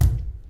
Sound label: bathtub step; Golden Crayon Sounds